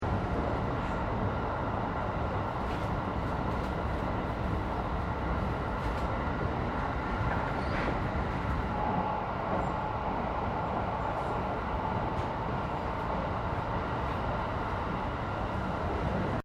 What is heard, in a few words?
Train Regional Local Interior Operating Loop, Japanese Trains; Aomori; Vehicles; Train Jr Regional Aomori Local Interior Operating Loop